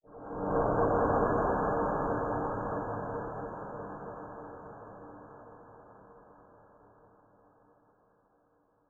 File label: dark